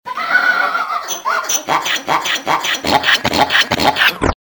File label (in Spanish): Cerdo